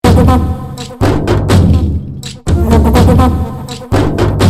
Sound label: Sms Tone